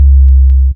DM BAS, Dance Mega Synth; Best Service; 90 s Sample CDs

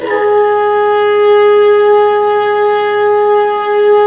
BRS TPMT, Harmon Amb; Roland L-CDX-03 Disk 2

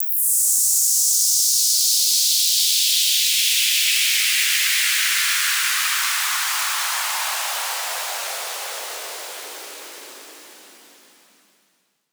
Standard White Noise; Antidote Advent; Infected Track Drums Ableton Project

